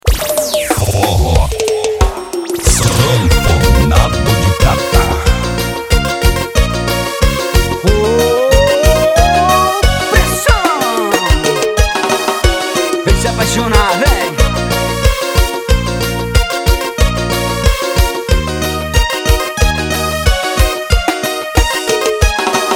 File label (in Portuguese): sanfonado